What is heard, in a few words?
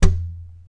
TAP, FLAMENCO Guitars